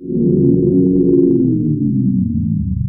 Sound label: Pad